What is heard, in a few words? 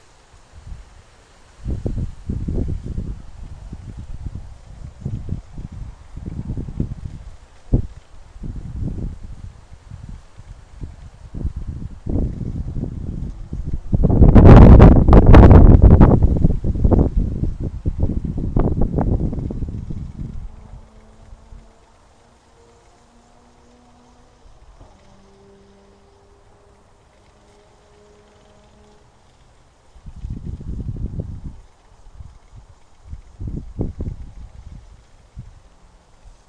in a field with a small wind turbine on a windy evening